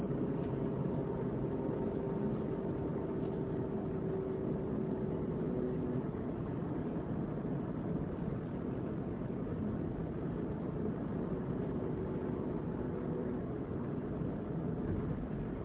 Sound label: Chevy Bolt Odd Noise at Low Power Levels